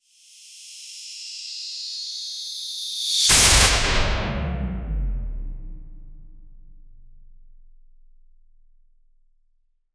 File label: lantern construct whoosh; energy